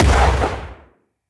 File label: Stun Grenade 40mm Shot; Weapons